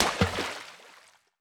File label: frag physics bounce water; gmod; viper